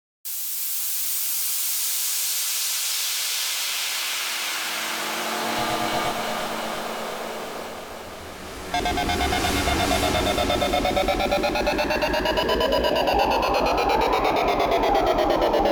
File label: FX; FX House Drum and Bass Techno Dubstep; BHK FX; Kontakt; Loopmasters